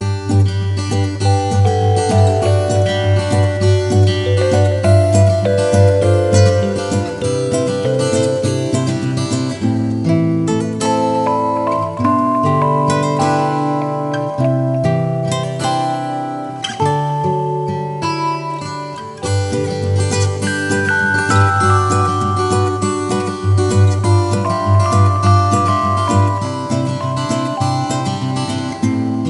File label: Neighborhood Music; Daring Game for Girls; NDS